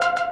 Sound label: synth; osu